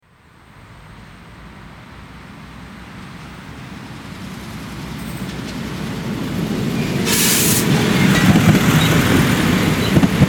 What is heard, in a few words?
train passing; comboio